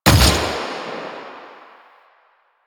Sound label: Fire Shot; Duviri; Incarnon Adapters; Incarnon Sybaris Prime; Weapons